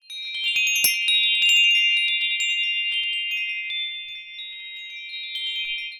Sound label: wind